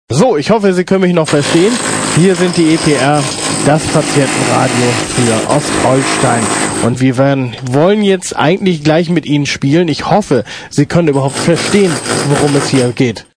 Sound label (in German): Bohrer im Studio